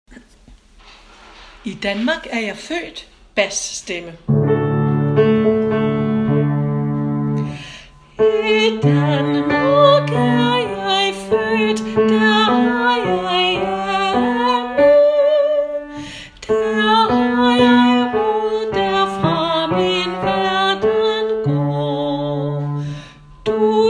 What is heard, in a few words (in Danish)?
I DK - bas